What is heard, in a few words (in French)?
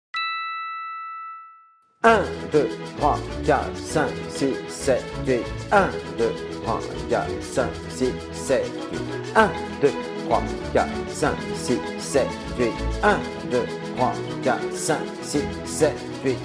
ruban musique avec comptes